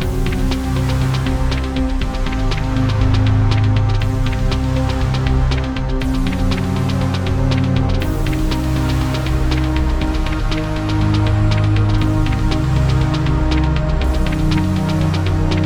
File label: Self Destruct Sequence, action